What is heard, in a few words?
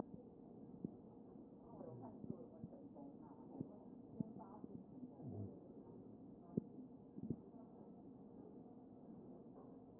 A CHEST